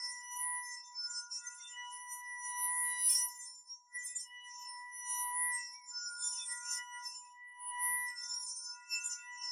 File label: Heart Piece Idle Loop; Hollow Knight